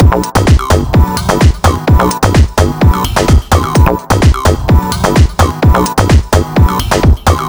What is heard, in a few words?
full, 128 bpm, nu dance electro; Zero-G; Soundclash; Acidized Wavs; Construction Kits; Lost In Space